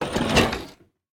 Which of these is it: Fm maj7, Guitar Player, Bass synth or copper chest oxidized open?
copper chest oxidized open